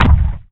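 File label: ARTSH HYBR, percussion metallic; Rarefaction; Poke in The Ear With A Sharp Stick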